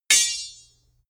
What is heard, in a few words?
sword hit sweetener